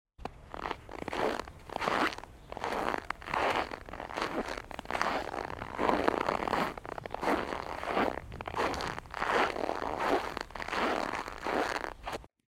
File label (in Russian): zvuk snega